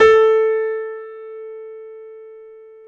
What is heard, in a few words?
PIA MICRO, MICRO-PIANO, Roland